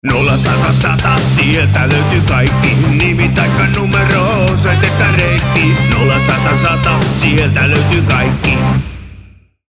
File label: Jingle; mainosmusiikki